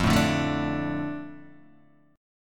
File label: F 6th F down Guitar Open D